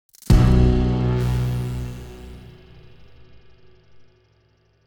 Stinger Synth; mcsm music; General Stings

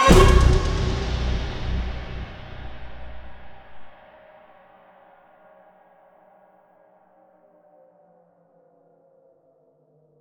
Stinger Big; mcsm music; General Stings

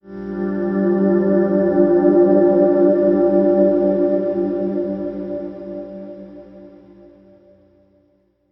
Soft sting G; mcsm music; General Stings

